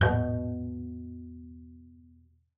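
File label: bass col legno B2; Xsample VOL-5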